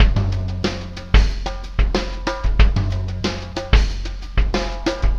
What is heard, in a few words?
brushed, drum loops